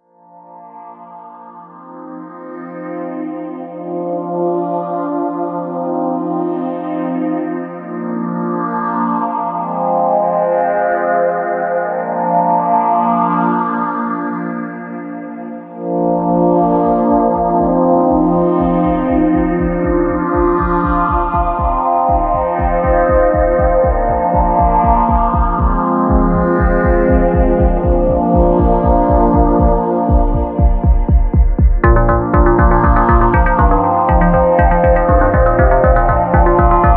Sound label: soundtracks